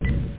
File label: button